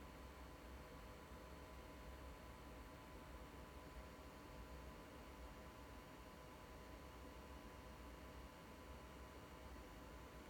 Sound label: noise; Acer Predator BIFROST Radeon RX 9070 XT OC